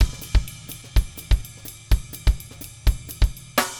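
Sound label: 126 Latin Jazz Drums No Snare; Pro Tools Essential Loops; Digidesign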